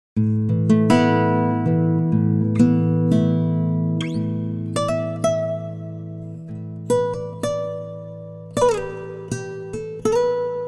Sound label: Guitar, Time-Stretching